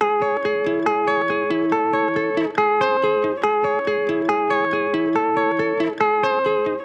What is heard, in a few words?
Guitar Loop Wet - 140 BPM C# Min; Melodics; Instrument Loops; Guitars; Processed; Cymatics; Cobra Hip Hop Sample Pack